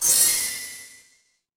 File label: magic scifi spell